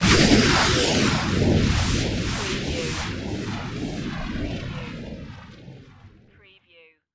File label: Magical Whoosh Airy